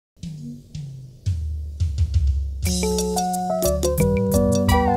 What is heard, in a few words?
Awade Instrumental